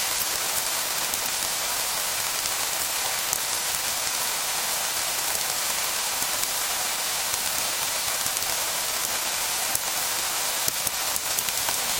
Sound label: ambience rain